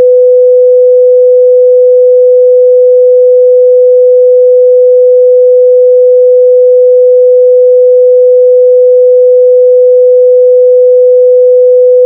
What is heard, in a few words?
700hz; conti